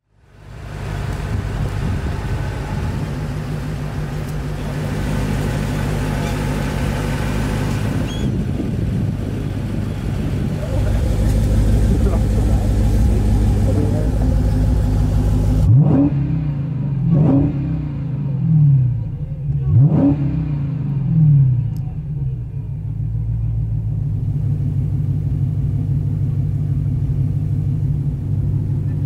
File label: Dodge Challenger